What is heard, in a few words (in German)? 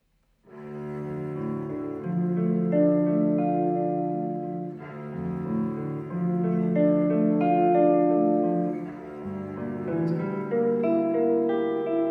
Fantasie d-Moll